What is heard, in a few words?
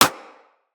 Bullet Impact Generic